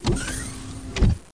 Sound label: foot whining stomp, Robots; Gunlok